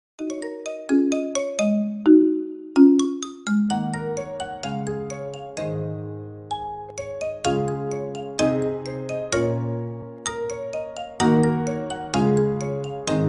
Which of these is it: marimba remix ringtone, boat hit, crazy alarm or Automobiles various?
marimba remix ringtone